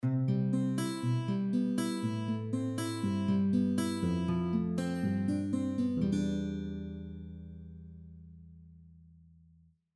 1890 Washburn

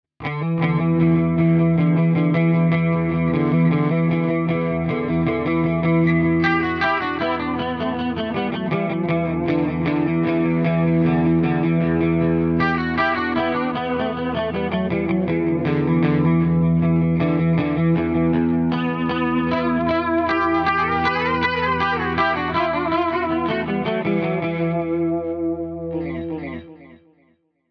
Neural DSP Soldano SLO 100 Dotted 8

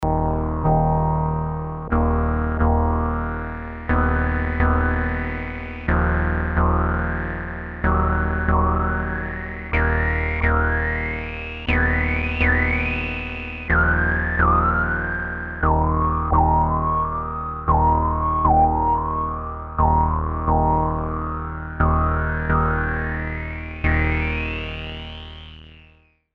Monopoly Dark Paraphonic

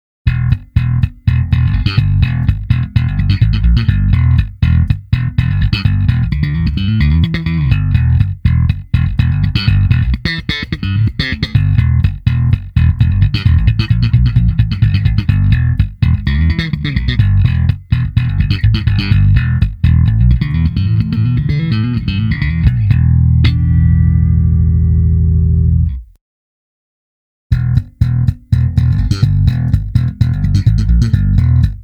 Slap

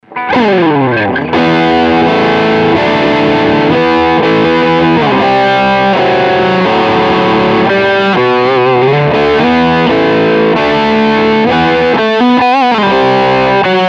Riff Full

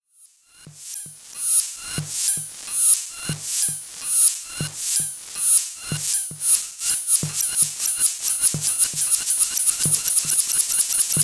Doepfer A 143 3 QuadLFO Drone

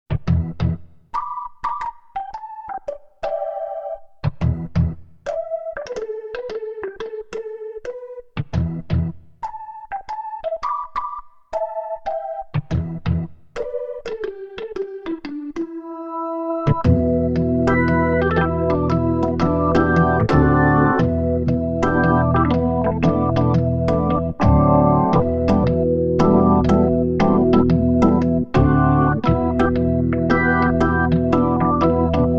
Sequential Prophet X Hammondtized

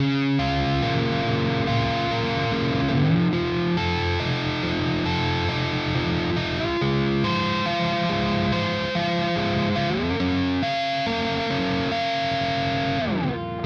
Schecter Omen Elite 7; Transparency

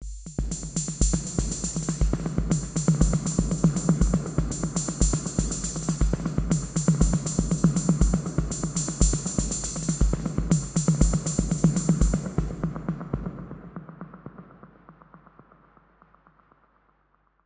Ableton Echo

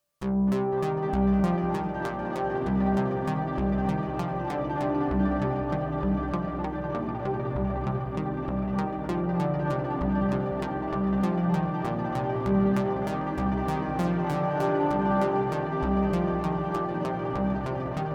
Eventide H9000 Resonator

